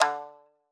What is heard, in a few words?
marimba shamisen; ゆめ2っき